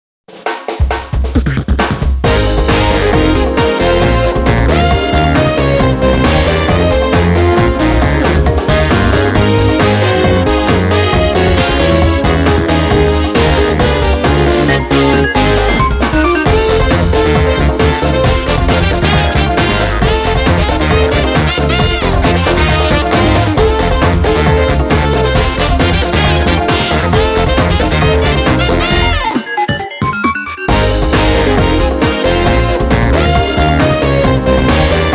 Music Success event

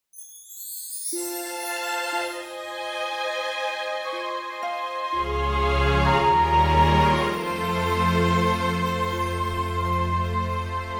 Evil Sting; Agatha Christie Evil Under the Sun; Poirot

